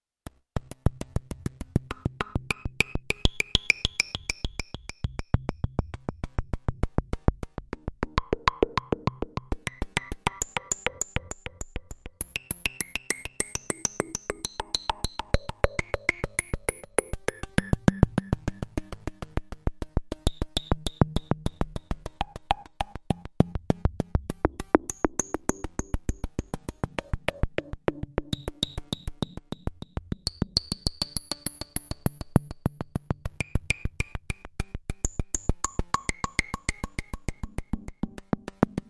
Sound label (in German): Dubstep Sequenz